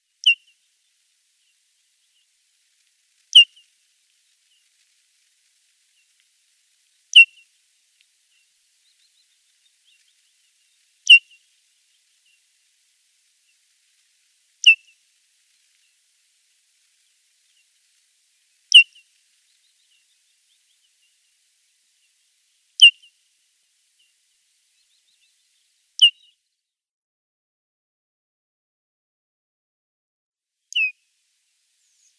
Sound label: Lapland Longspur calls